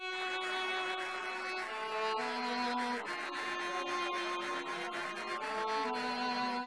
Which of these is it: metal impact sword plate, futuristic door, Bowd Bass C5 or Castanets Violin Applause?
Castanets Violin Applause